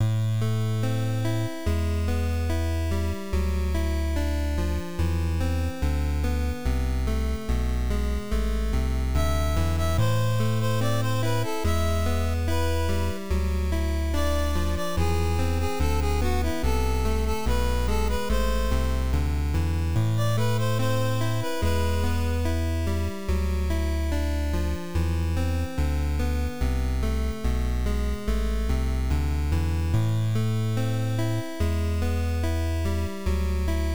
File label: gameover; BatsAndPray